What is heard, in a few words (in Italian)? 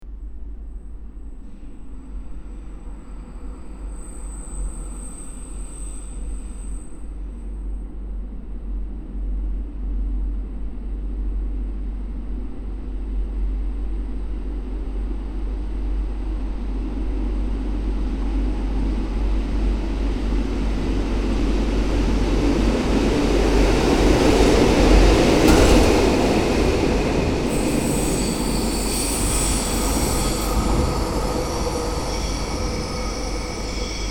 in transito Centovallina Stazione di Locarno Sant Antonio; rumore lontano